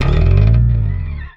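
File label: slap, bass; Xsample VOL-1; SLAP+THUMB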